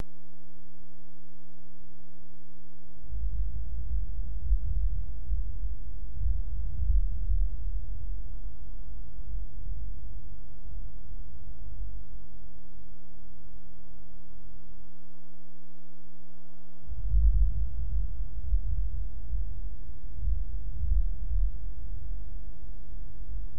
Thunderstorm Wind

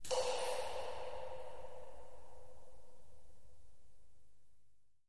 Echoey Cave Drips Wa

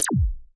laser; lasers

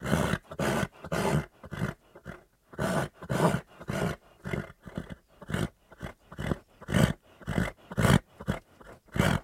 Horse Breaths Excite

